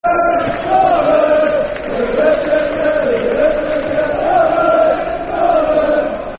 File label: Football Chants (Soccer Songs); Der Rup De Der Rovers; Blackburn Rovers Brfc